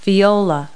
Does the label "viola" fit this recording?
Yes